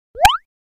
alert no

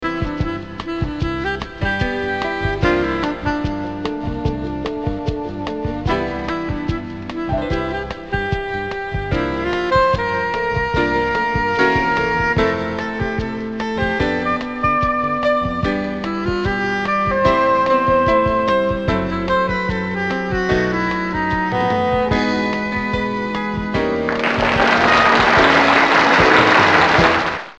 background

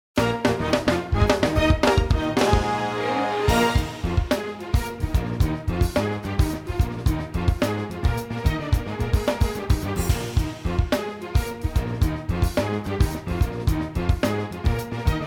bgm

